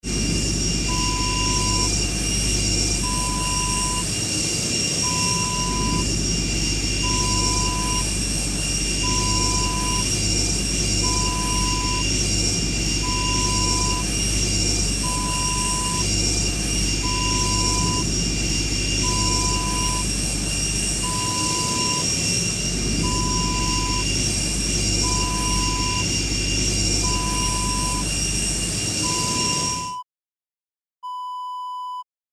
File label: airplane turbine